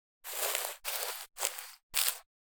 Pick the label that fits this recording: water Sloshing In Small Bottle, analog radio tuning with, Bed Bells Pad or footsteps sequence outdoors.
footsteps sequence outdoors